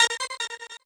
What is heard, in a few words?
normal hit normal